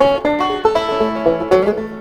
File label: banjo GSM; telecommunications; numerisation; berthet; module numerisation